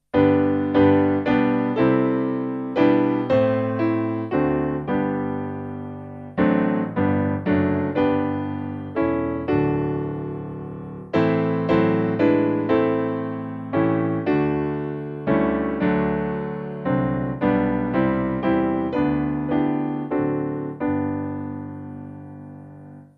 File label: Piano; St Agnes